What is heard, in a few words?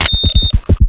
Heartnogo; Waterski